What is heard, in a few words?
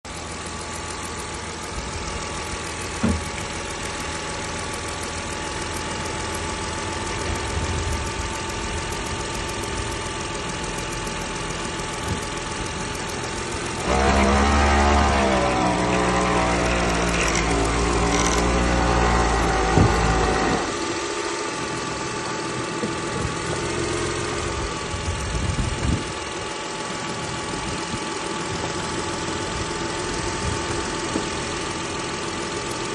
mercedes w204 c300 strange engine noise when cold occasionally bump